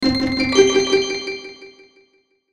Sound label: Logo Orchestral Twinkle Delay; Sonic Logo; Blastwave